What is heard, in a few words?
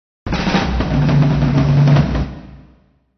fills jazz